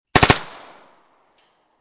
MP5 9mm 5 Burst Barrel, Machine Gun; Machine Guns; VRSonic

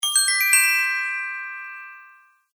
Fantasy Chime Stinger; Musical

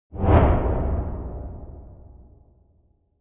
Whoosh; Whooshing sound for video editing, movements, logos, flash, or animation; Sound Design

